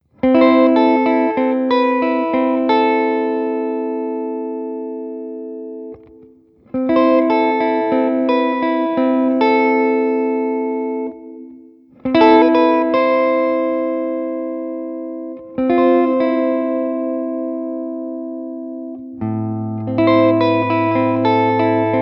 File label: reverb